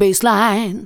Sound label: vox, Techno Trance; Ueberschall